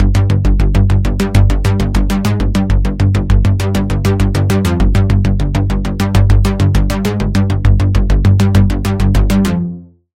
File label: Pulse; Routed Sound; Sylenth1 Expansion - Quantum Enforcer Vol.1